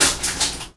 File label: Downticks